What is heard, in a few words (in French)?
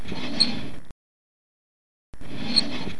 tiroir